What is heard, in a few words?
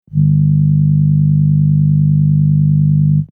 50 hz hum